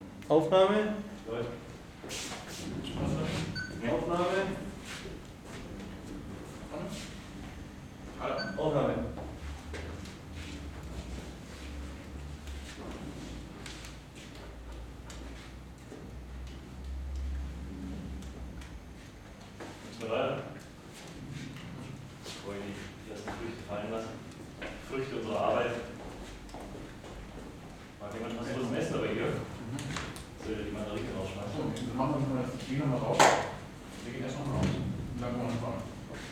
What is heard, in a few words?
Juggling